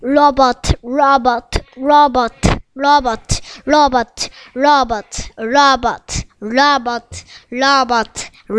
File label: robot